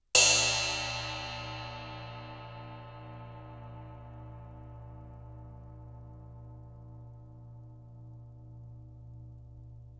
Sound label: Ride crash, cymbales